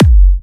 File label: F# kicks; markus hakala kicks